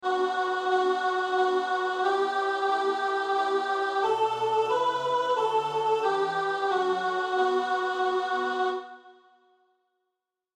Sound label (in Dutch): tutti; Motetten; Ave Regina Caelorum